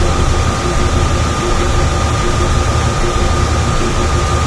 turbine loop, ambient machines; Source Engine; cstrike

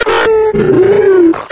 zombine charge; npc; source engine; episodic

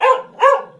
dog med inside bark; ambient; animal; Source Engine; cstrike

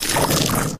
player enter stick paint

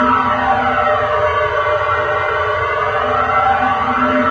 delivery tubes lp, industrial; video games; ambient

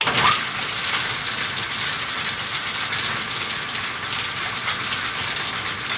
start and loop; Left 4 Dead 2; Source Engine

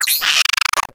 short jump; player

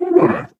ballbot agreement

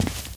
walk tile, footsteps

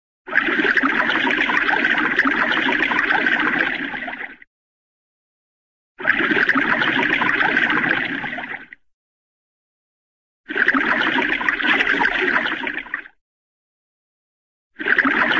water, underwater aqua by, slow; cartoon

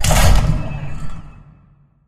beam emitter end; props; Source Engine; Portal 2

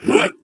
jump; Portal 2; Source Engine; video games; ballbot

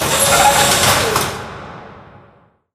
motel wall collapse; Source Engine; Portal 2

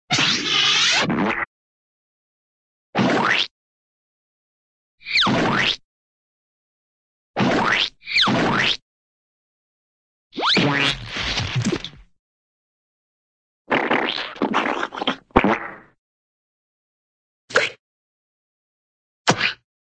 splat, cartoon air by and splat

